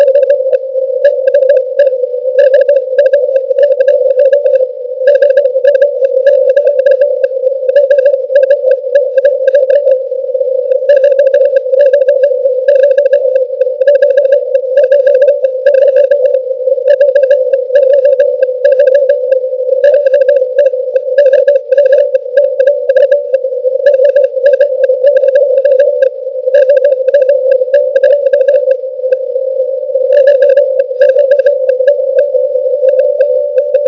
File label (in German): SAQ Aufwärmphase